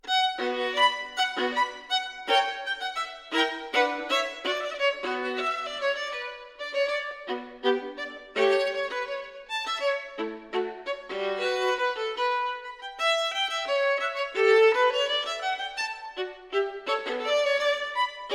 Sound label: violin partita in B minor, tempo di borea; violin partita no 1; bwv 1002